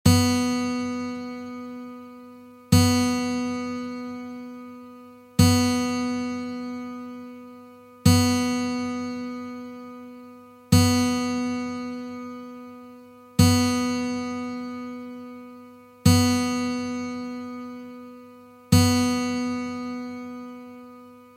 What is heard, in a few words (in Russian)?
struna Zvuki strun akusticheskoj gitary